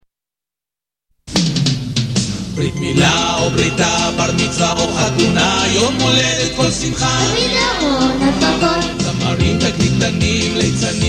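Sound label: jingle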